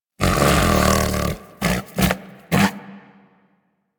Predasite Vocal Snore; Warframe; Hunting Critters

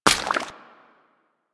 Steps Water, Footsteps; Foley; Warframe; Grineer Royal Jester

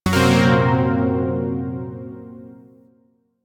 Shawzin Blues Chord; Zariman Shawzin

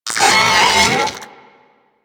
Vocal Attack; Warframe; Corpus; Arachnoid Wraith

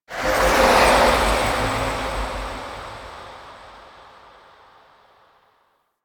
Golden Maw vision appear; Warframe; Ambience; The War Within

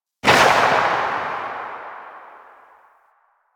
Epitaph Prime Tail B, Weapons Sidearm; Warframe; Tenno; Wraith Sidearm

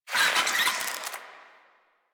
Infested Ashen Vocal Attack Death; Warframe

